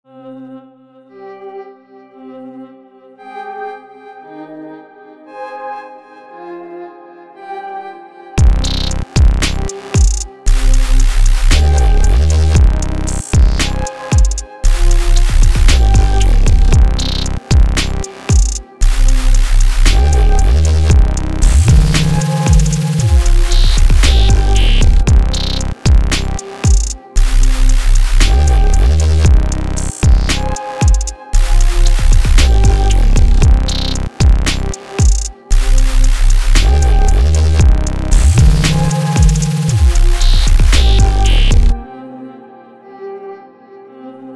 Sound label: DubStep